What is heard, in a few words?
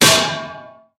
junk fall; guns; tanks; War Thunder